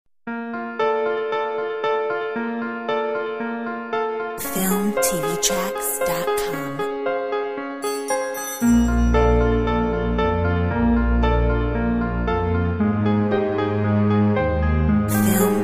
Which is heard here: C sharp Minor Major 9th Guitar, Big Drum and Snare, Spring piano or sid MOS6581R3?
Spring piano